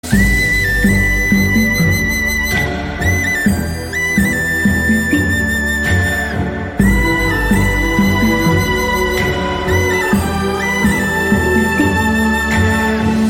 BGM; Telugu Private Songs; swara

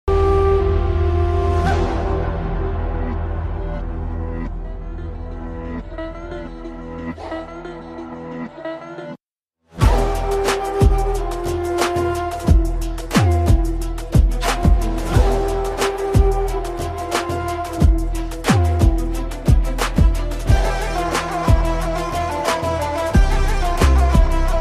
villain bgm; king of kotha; kannan bhai; dulquer

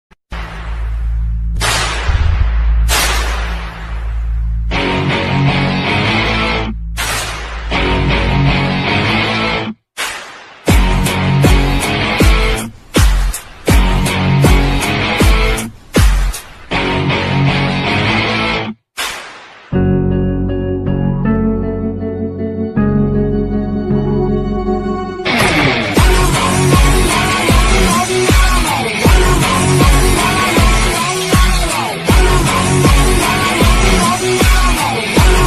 adithya varma bgm ringtone; adithya varma background; monk entertainment

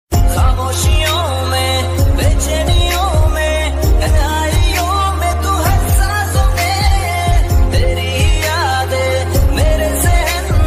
classic ringtone

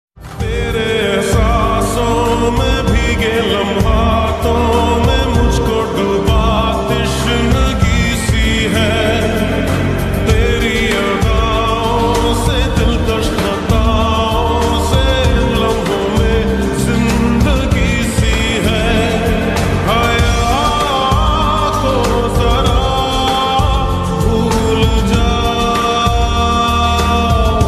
lofi

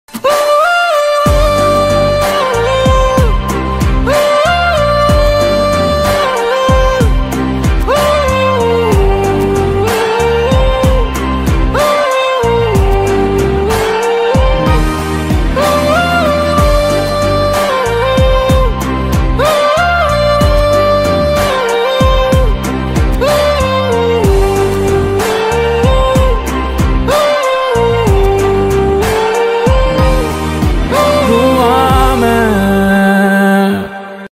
Ringtone bgm; Animal

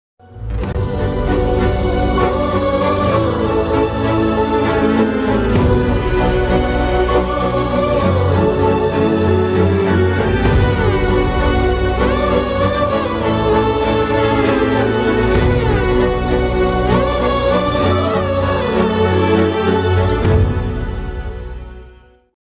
trailor bgm music ringtone; skanda

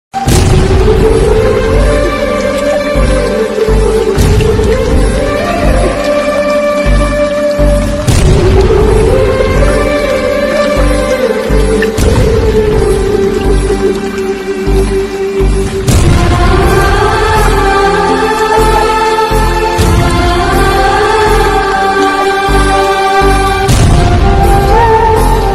sad bgm; andala ramudu

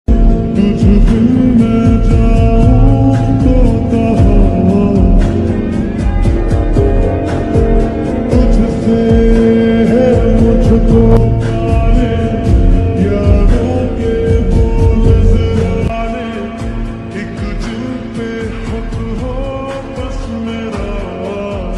lofi song ringtone

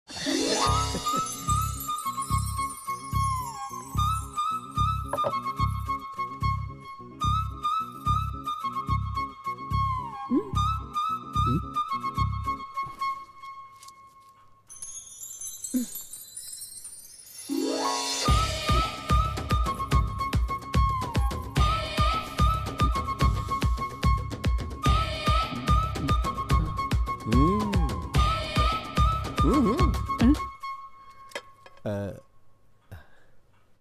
love ringtone; zombie reddy movie

